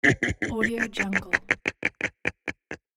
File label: evil laugh